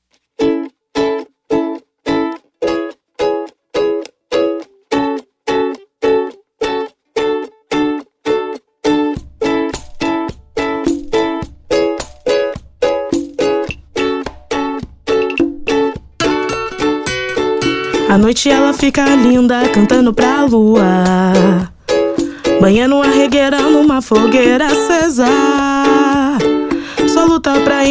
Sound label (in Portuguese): reggae